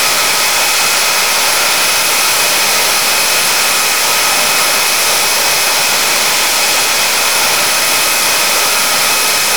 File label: Aircrafts F-5E J85GE RPM3 Front; DCS